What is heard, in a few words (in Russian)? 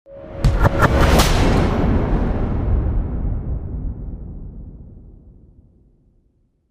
Ryadom proneslos opasnoe